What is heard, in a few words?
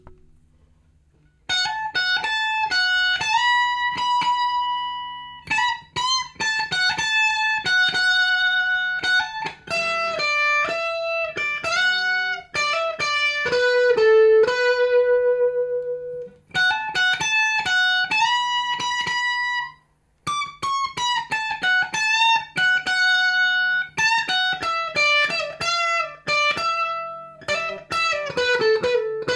Slow solo